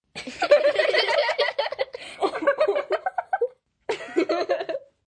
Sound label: Group Giggles, Laugh, Group, 3 girls, giggling, kids; Laughter Sound Effects; males, females, girls, boys, human voice